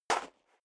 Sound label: step high heels gravel light single stair down; schritte; Footsteps